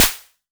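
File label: Olson SD C, snare drum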